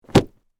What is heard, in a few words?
Carpet Roll Drop, Foley, Carpet, Roll, Drop, On, Cement; Drops; Sound Effects:Objects